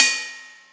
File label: crash single shot d sharp key, cymbal; drum sample; one shot